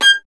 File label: STR VLN, Solo Instruments; Miroslav Vitous